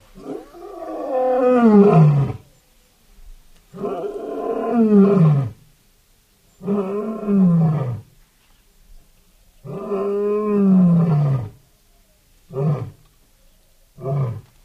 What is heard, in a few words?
Lion Groan Med Close; big cats